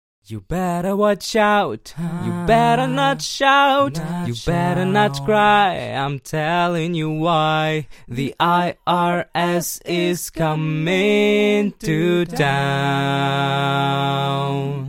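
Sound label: pop festive 122 C sharp minor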